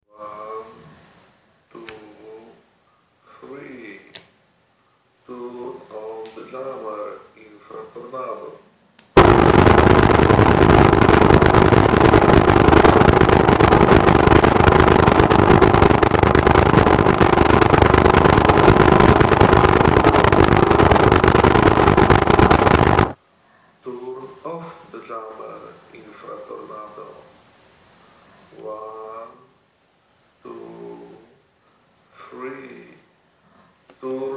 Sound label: Jammer real test 3 m; LGE460